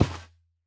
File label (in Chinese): 骆驼沙地脚步声